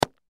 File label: Baseball Glove Catch; SPORTS; Blastwave